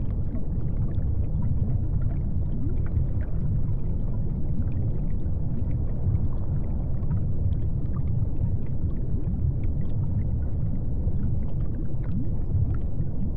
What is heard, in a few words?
underwater